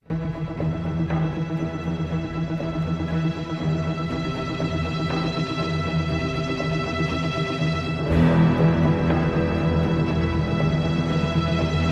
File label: Desperation Ambient